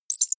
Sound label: bat squeak